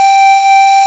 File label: pipe G4; AKAI S-Series CD-ROM Sound Library VOL-8